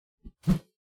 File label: melee attack swoosh heavy; weapon swooshes